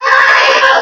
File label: pain; alienarena; martianenforcer